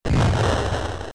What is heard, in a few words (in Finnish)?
jysähdys; carnage